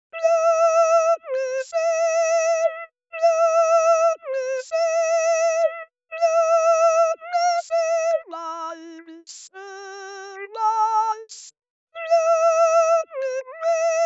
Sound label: soprano verse; KTH